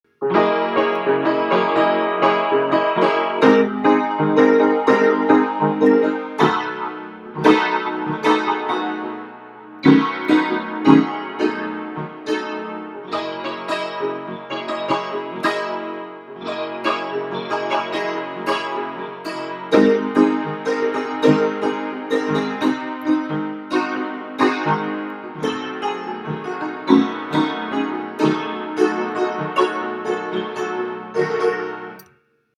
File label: raggedy piano